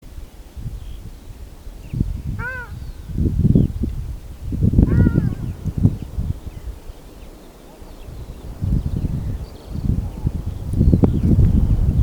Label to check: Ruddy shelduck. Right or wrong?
Right